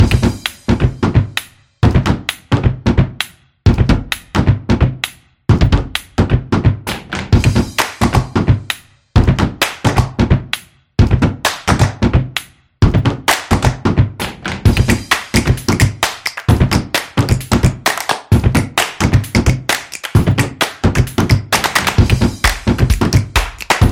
Claps Stomps Snaps; percussive nation vol 2

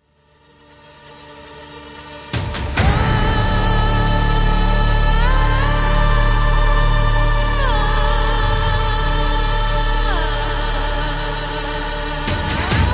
Islam

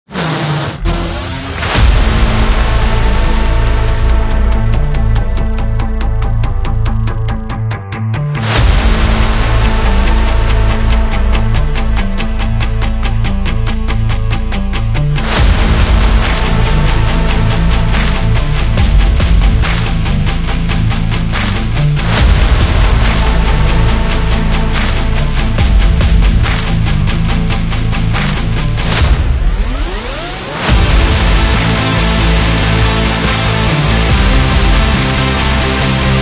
Rock Trailer, electro rock

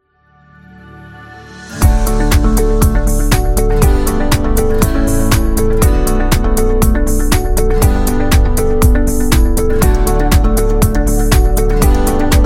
Corporate; corporate promo

